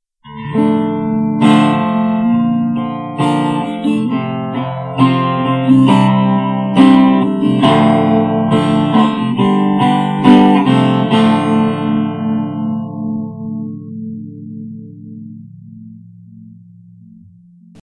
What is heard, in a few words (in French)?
accords